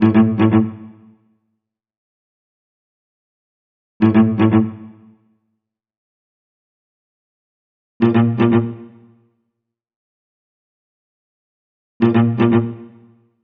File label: Cello Pizzicato; Sonic Foundry (Sony Creative Software); Club Joints and Street Anthems; Ruff Side